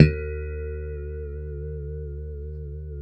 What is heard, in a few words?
E3 pick harmonics, guitars; Spectrasonics; Hans Zimmer Guitars VOL-1; JC-HARMONICS